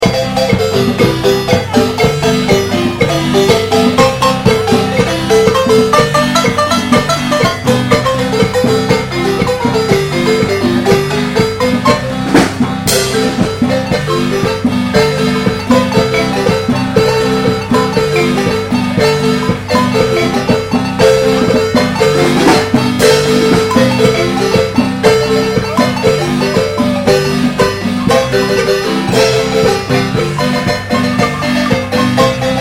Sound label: Marimba Palenque; Mexico; Usumacinta; land under usumacinta ueber allen ufern